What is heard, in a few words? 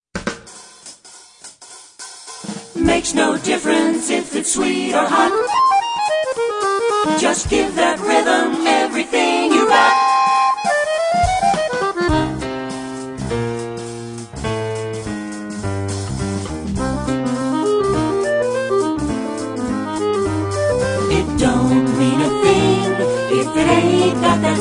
Swing Medley